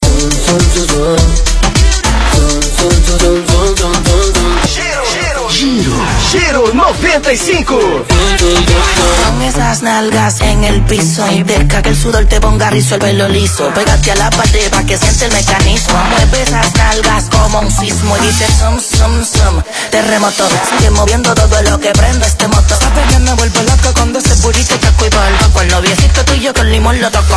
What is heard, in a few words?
Reggaeton